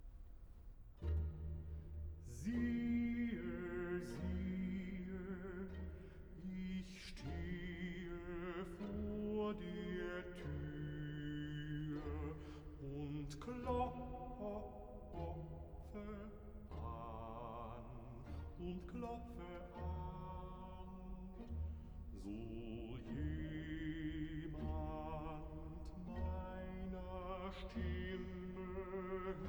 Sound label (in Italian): Recitativo (Bass); BWV 61 'Nun komm, der Heiden Heiland I'; IV. Recitativo (Bass) 'Siehe, ich stehe vor de; Bach; Köln; 1st Sun in Advent; Cantata